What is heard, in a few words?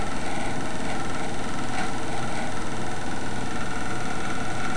broken fan, the sound of the broken fan wobbling; Loop; dklon